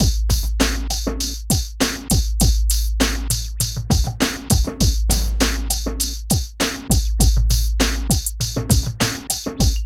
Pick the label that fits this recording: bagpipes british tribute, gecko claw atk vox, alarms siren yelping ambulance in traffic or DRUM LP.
DRUM LP